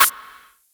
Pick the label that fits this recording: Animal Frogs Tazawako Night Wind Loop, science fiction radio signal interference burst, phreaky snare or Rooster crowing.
phreaky snare